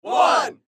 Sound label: Crowd